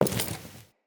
Walk Default, Footsteps; Enemy; Lady Deora

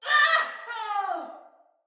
Death, Soldier Female; Battle; Barks; Dialog; Sinecure Soldier Female A

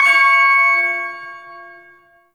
Shawzin Oct Three C Sharp; Music; Warframe; Duviri; Ersatz Shawzin

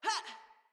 Attack Female; Battle Barks; Envoy

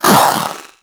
Zanuka Companion Vocal Bark; Pets; Warframe

